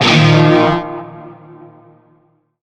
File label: Shawzin Blues Chord I; Music; Warframe; Narmer